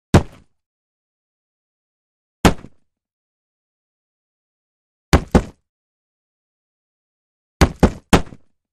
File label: Board Hits 4x; punches kicks hits bones